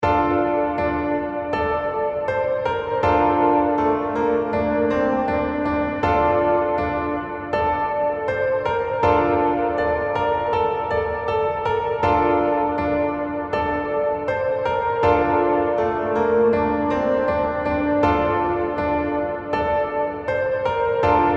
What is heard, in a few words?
Dracula Theme, Interlude 80 bpm; Horror Sound Effect